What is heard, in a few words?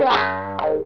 PIGGY WAH, Funk Gtr & Wah; PlatinumPhattCD2; E-MU Producer Series Vol. 8 – Platinum Phatt